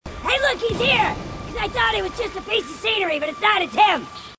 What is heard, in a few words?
grunt; h3dialogue; grunts